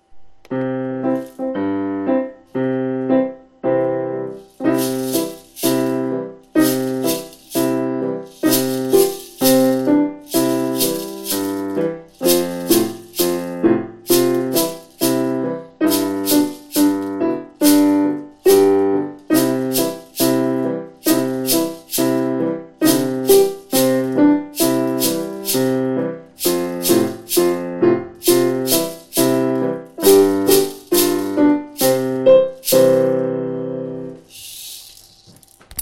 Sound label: music with maracas